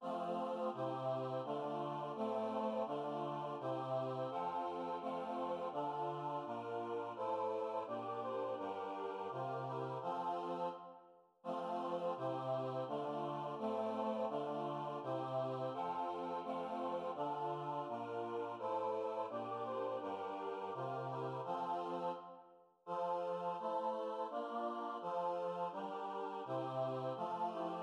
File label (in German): vierstimmiger satz